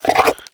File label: breath in, headcrab black; creatures